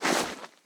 snow, step

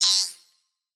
small, slime

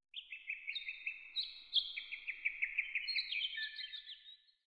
coast bird; matmos hl

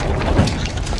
minecart base

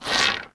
bow stretch; matmos interactions

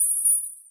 crit emit; matmos interactions

